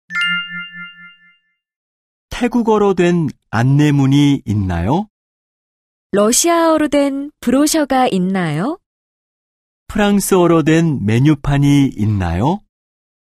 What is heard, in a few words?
K-POP